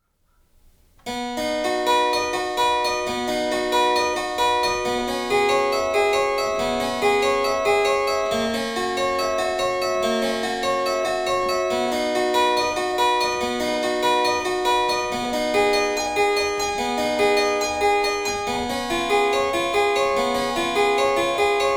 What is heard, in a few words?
prelude in c major; js bach